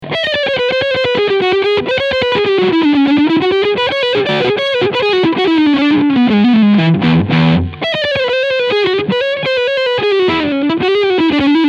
Fender AM Pro II Strat HSS Leadsound Neck Singlecoil